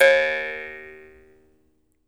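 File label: Jaw Harps, Pitched Percussion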